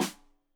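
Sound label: Snare Stereo, Drums; Deeply Sampled Drums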